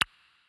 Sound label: coin value; DragonsLucky8WD; wazdan